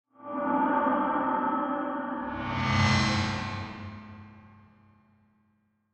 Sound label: Distortion Left To Right; Distortion Flange Whoosh Right To Left Production Element Imaging Element Accent Transition